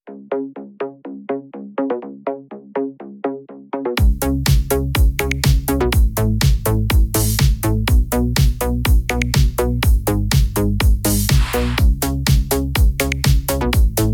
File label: Italo Disco; podkłady dla wykonawców weselnych; Aranżacje Disco Polo